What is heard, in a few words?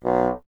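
wind bassoon B; Miroslav Vitous - Solo Instruments VOL-1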